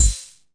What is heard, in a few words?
bdhh, Wersimat 24; DRUM MACHINES